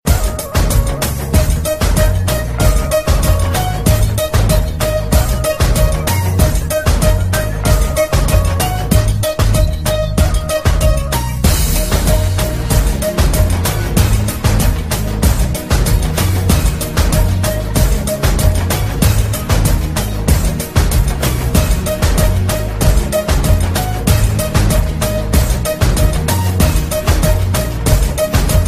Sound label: Indian Style